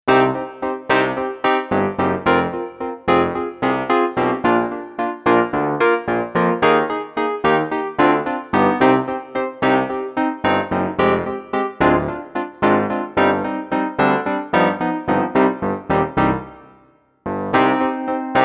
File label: Electric Piano 1